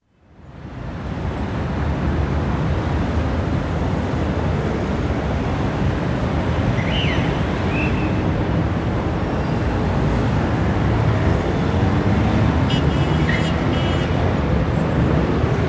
France, Arc De Triomphe, heavy traffic with occasional police whistles; Traffic And Car Sound Effects; BBC